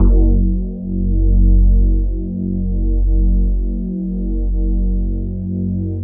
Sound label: E-HARP, DX HARP; Wizoo - Powered DX